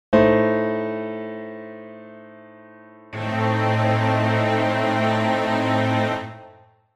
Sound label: a diminished chord